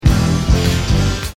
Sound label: Opening chords Stacked